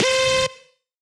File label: LD Festival Trap; Synthetic's Serum Collection